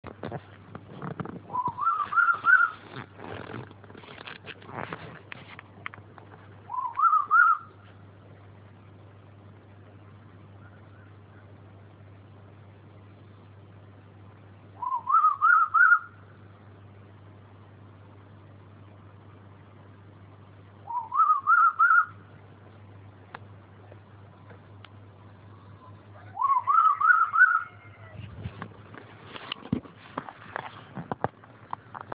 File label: bird sound low